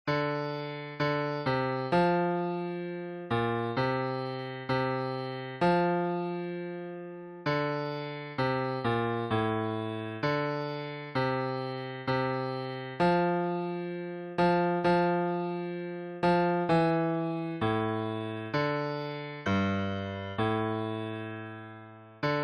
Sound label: Bass